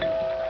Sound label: bonus answer on; wipeout